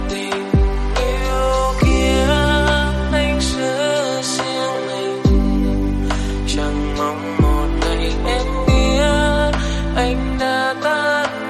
Lofi

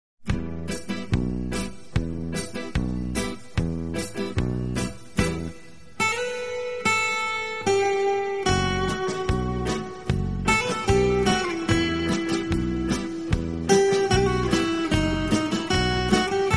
romantic guitar

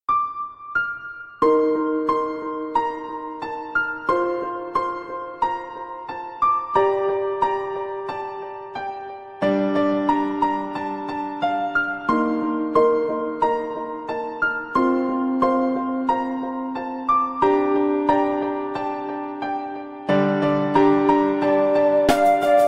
Illusionary Daytime Piano

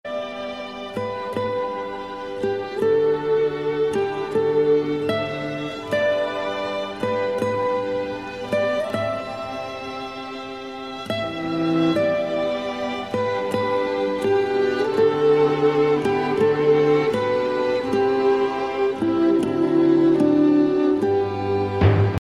auld lang syne

